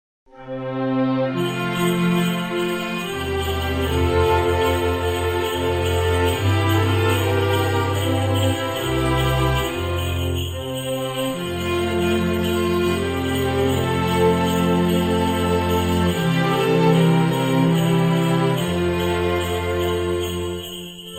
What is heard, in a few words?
Orchestra O Christmas Tree O Tannenbaum